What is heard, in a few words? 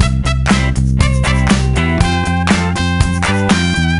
Flourish; gamerip; Happy Feet Two; nds